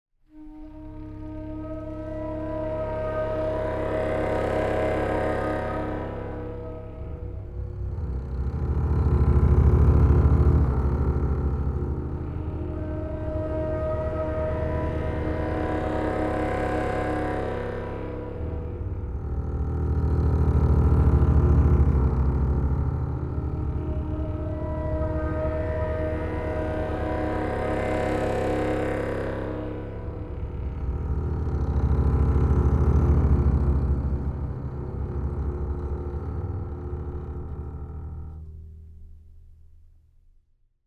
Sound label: Moods, No Cello